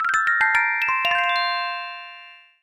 Common Fanfare

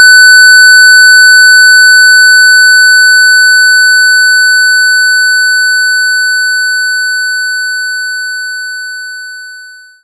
Square 1500Hz

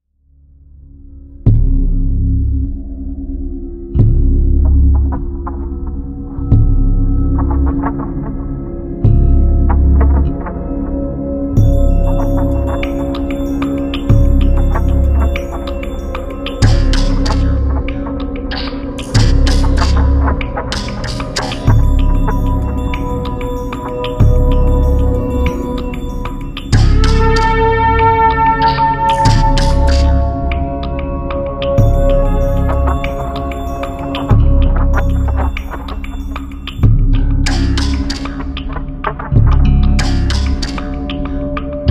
forest music; Richard Garriott's Tabula Rasa

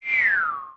Trap

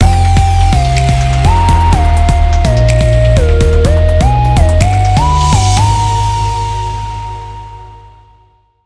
Start Jingle; christmas; Gran Turismo Sport; Transmission Mode Jingles

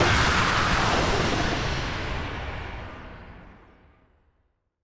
radio impact distortion digital

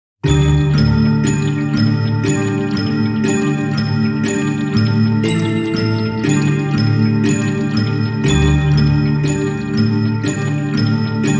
Old Clock

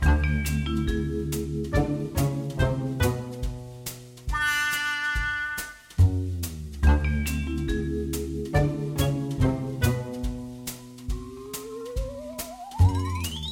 Music Boss In Game; farm heroes super saga; android

